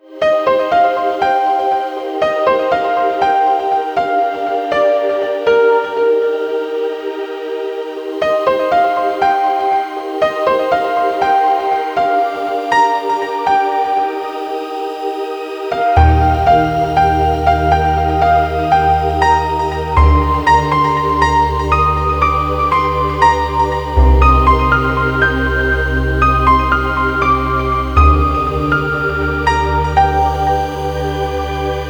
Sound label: phone hi-fi, ringtone; Waste Management